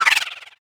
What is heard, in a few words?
movement whoosh flutter transition mechanical; kids game; futuristic